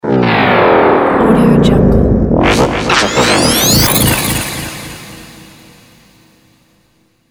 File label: low power logo; pentounicron